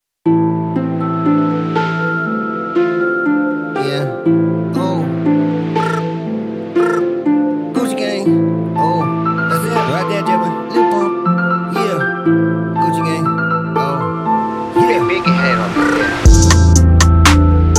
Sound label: Backing Vocals